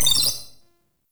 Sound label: Flying Robot Beep; Warframe; Veilbreaker; Kahl Quest